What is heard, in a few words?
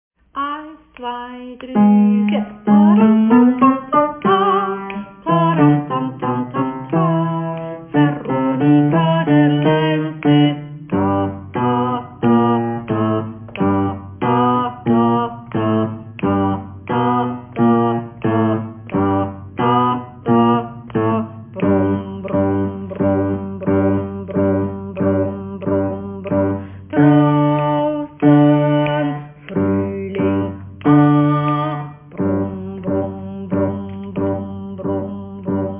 Bass